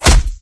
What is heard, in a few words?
hit punch